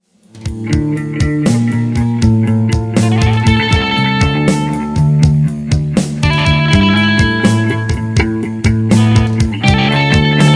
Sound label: glockenspiel